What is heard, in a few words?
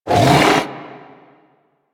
Rock Punch Cast; Warframe; Brawler